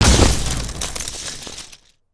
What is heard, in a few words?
earth smash; destructibles